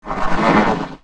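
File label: droid dead; Star Wars Knights Of The Old Republic I; XBOX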